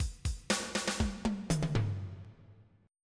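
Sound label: rock break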